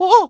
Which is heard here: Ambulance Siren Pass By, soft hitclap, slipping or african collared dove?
slipping